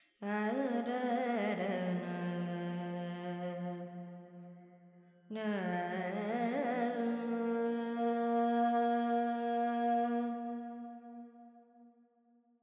Verb Dorian, Vocals, Carnatic Female, Alaps, 70 G

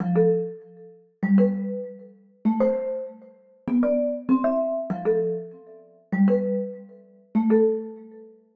Pluck Bell Pop C 98; Sample Banks; Melody; Keyboards

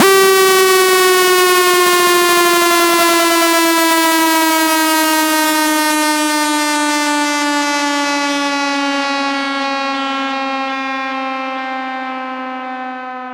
FX Downlifter; Sample Banks; Percussion

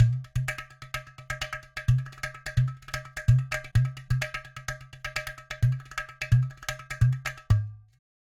Loops 128, Percussion, Ghatam; Sample Banks

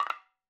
madalam notes R

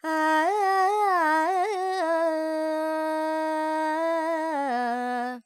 Phrygian, Vocals, Carnatic Female, Alaps, Dry; 70 G; Sample Banks

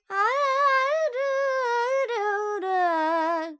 Charukesi, Vocals, Carnatic Female, Alaps, G, Dry

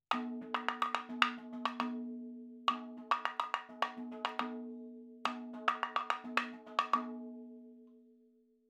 70 3 4 Mad Fills R, madhalam, percussion; Sample Banks; MADALAM 70 3 4 FILES

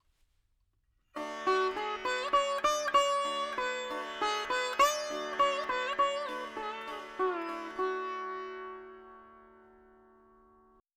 4 4 D 100 Dry, Melody Veena; Sample Banks

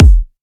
Kick Em; Sample Banks; Percussion

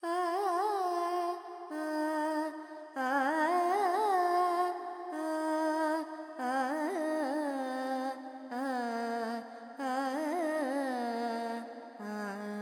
Pentatonic, Vocals, Carnatic Female, Alaps, G; Sample Banks

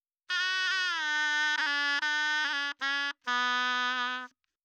3-4 Bm 70 Dry, Melody, Shenai; Sample Banks

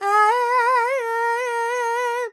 Dorian, Vocals Carnatic Female Alaps 70 G Dry

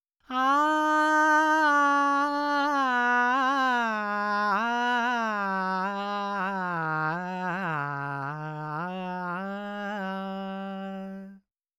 Dry 4-4 G 100, Vocals Male

